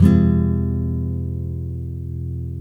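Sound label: nylon F# minor down, chords nylon; Spectrasonics; Hans Zimmer Guitars VOL-1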